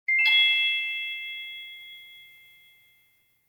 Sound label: Jingl; Shiju Hachi; PS2